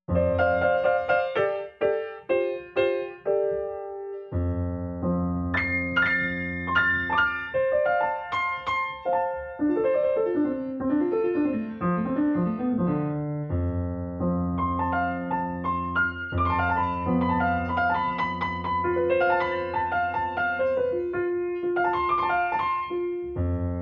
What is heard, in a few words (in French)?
HABILLAGE PIANO